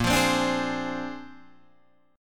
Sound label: A sharp 9th A sharp, Guitar Open D